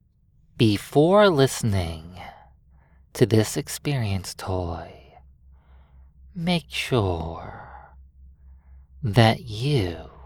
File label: Binaural Beats; Toy Hypnosis Brainwashing